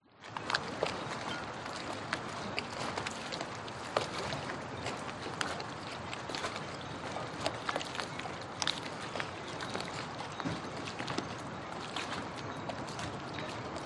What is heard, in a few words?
Marina Traffic waves Boats Construction Church Bells; XY; PCMD100; PMSFX